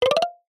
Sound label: ui tone short plucked nylon ascend